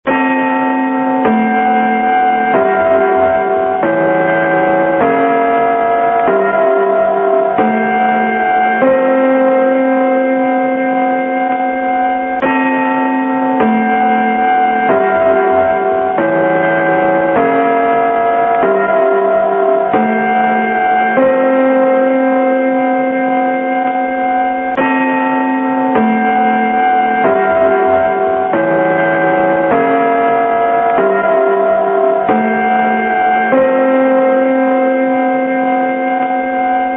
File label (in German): Gong Signet; radio tv historisch